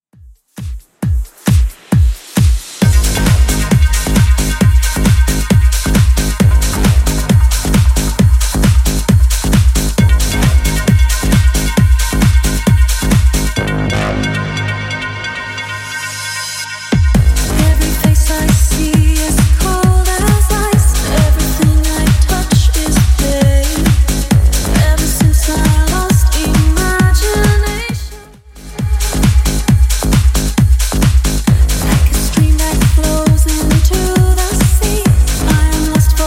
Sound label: 2ks Redrum 134bpm CLEAN